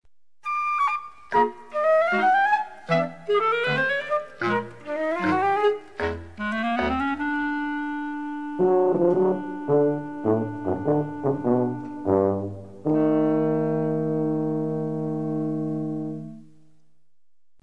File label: Short Bridge